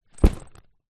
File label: bag ruck sack down on ground